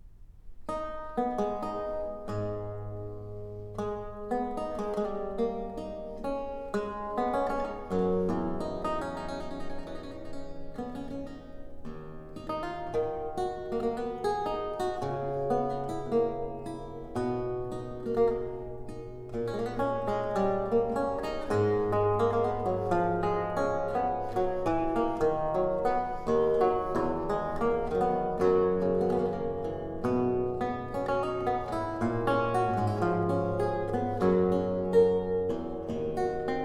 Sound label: Prelude, Lute Music